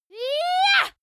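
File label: female attack ya long, screams; schreie; menschen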